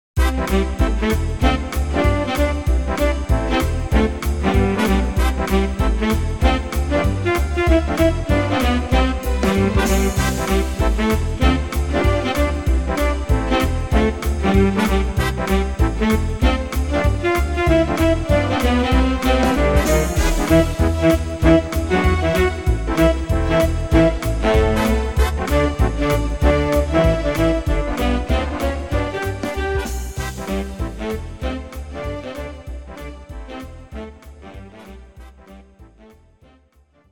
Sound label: QUICKSTEP